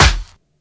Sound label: nice snare drum sound c key